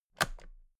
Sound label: toolbox latches close heavy